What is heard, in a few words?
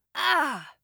ouch boy; spiritheroes